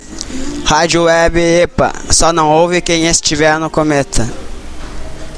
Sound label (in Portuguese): vinheta